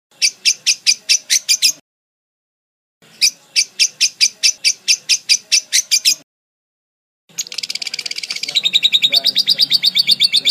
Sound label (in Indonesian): parkit isian ngekek lovebird